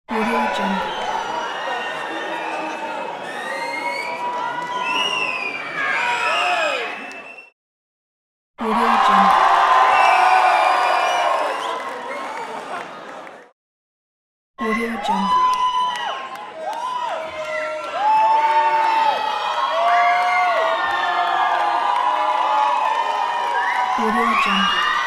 audience screaming

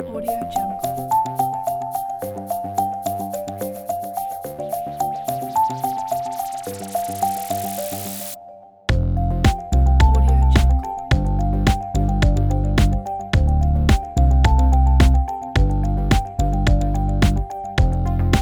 progressive technology; reservestock

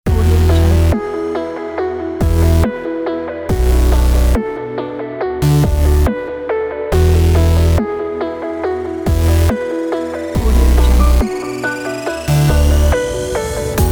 technology background

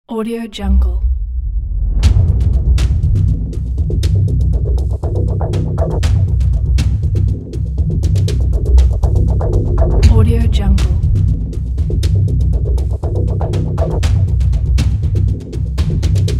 cinematic percussion

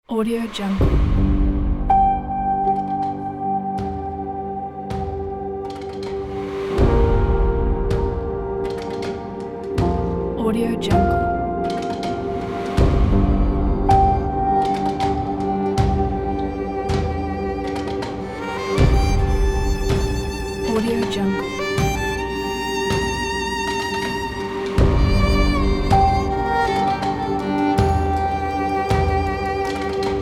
violin piano background music

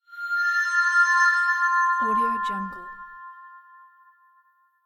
magic metal sound

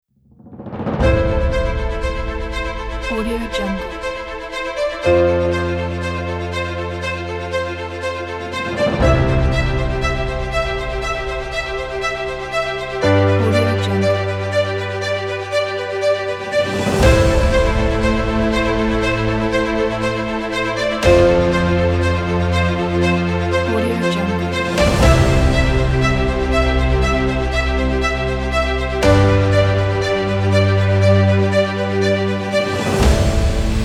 inspirational epic orchestra; garybirdman

